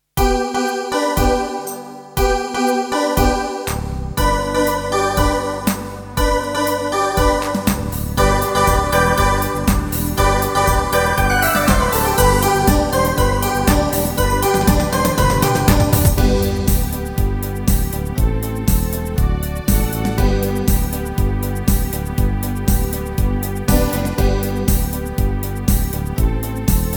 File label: PETSHUP AMBIANCE